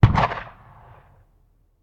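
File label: Cannon Black Powder; Sound Ideas; Guns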